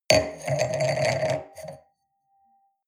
wine glass place table hard wobble; berufe; soundpool

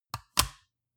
metal device click lock in; industrie-wirtschaft

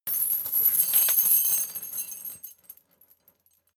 metal chain midsize handling jingle

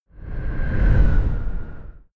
sci-fi vehicle train passing fast short

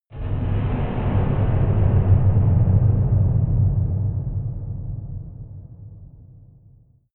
unknown danger, whooshes rises, hits impacts

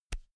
pull out usb c; objekte; elektronik

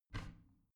bathroom cabinet warped open soft; objekte; tueren

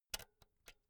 pull out jack cable slow; objekte; elektronik

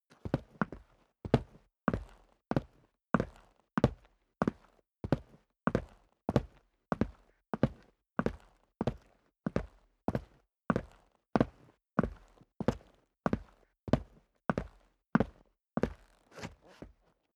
step boots concrete downstairs sequence; schritte

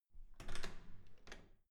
security door open soft

